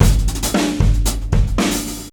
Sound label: HATS UP 115, OFFMIKE DRUMS